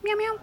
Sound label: speech rec start